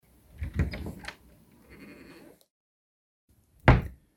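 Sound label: wardrobe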